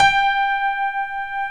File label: DIG ACU PIANO; OMI - Universe of Sounds